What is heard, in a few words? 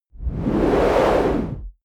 whoosh noise sweep hit low long